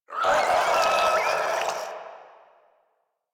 Infested Crawler Death; Warframe

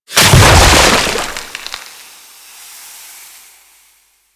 Infested Moa Slow Bomb Explosion; Warframe; Attacks

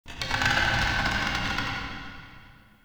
Ancestor Tree Wood Creak; Soul Plane

